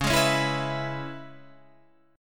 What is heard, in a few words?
E 6th, C sharp